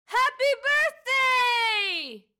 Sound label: birthday sound